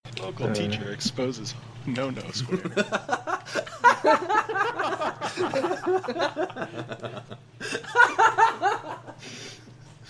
mutt laugh

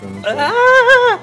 scream

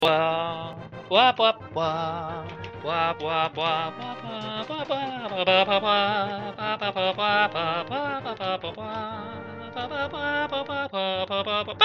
boss music

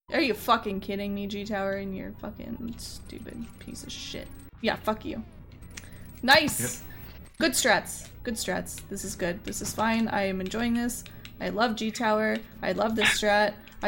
rage

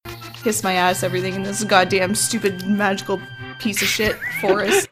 rage

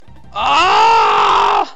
scream

